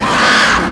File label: jump; quake2; coop; insect